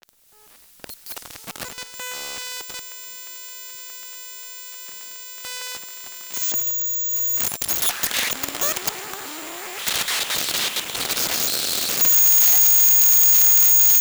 MacBook Air booting, opening Logic project, playing 4 bars - with coil on number 5 key; EM Pickup